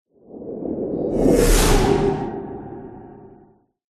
whoosh element pass by machine vehicle

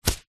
foley raincoat wearing grab arm; zapsplat

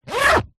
foley luggage case small childs zipper

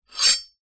warfare knife blade draw

